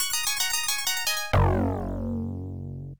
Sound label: game over; Monkey King Master of the Clouds